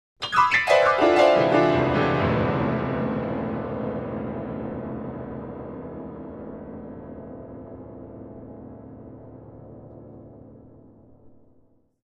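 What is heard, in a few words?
Grand Piano Descending Chords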